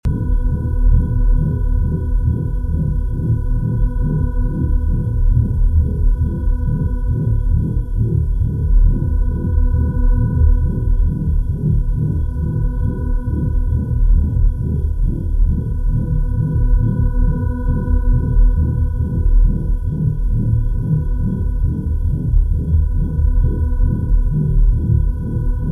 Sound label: Inside Futuristic Spacecraft Loop; Ezra The Stranger; By Fxprosound